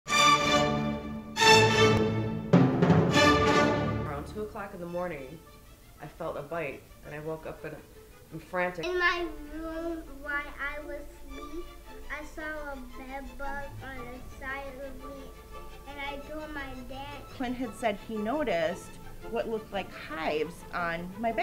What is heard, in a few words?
Classical